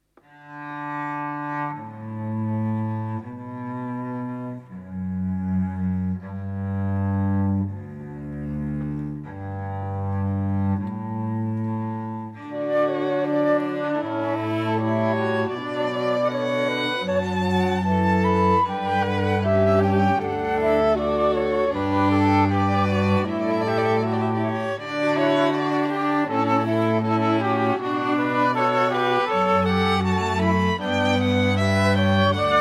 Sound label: Quartet Canon; Canon by Pachelbel